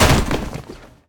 wall plastic; Golden Crayon Sounds

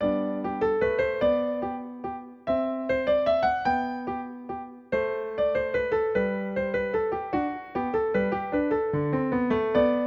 menuett g dur, Yamaha NP-30; Demo Songs

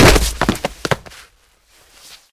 linoleum fall; Golden Crayon Sounds

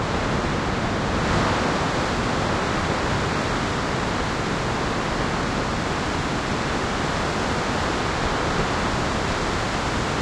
wind; Golden Crayon Sounds

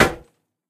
wall metal; Golden Crayon Sounds

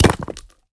wall rocks; Golden Crayon Sounds

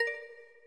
italic, TalkBack Sounds

